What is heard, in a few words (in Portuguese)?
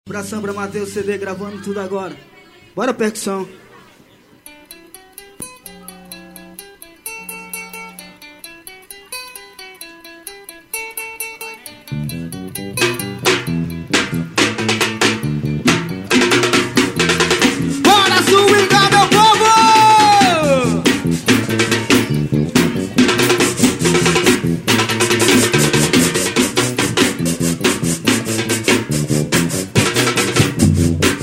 intro ministerio salve samba